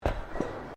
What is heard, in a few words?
Footsteps Boots Suspended Metal Walkway Step; Footstep Foley; Human